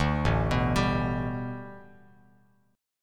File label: A 9th Suspended 4th Bass Standard, chord; A9sus4; arpeggiated; JGuitar